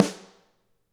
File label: Drum Set